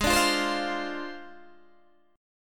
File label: Ab 6th Flat 5th Ab, Guitar, DADGAD